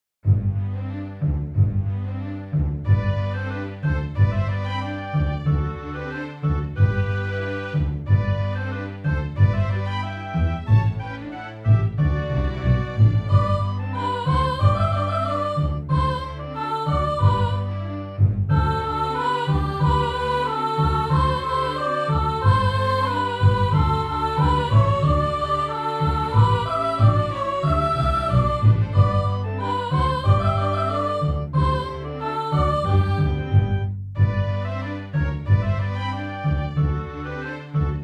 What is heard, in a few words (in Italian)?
Soprani; xmas